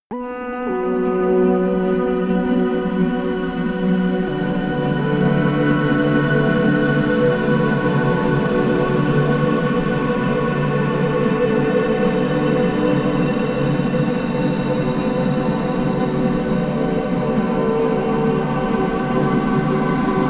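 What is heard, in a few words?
erratic, pads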